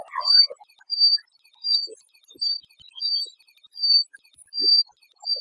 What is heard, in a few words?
Plumbeous Water Redstart male calls, Dongshi Taiwan